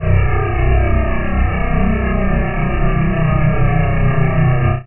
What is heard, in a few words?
deep dark bass pad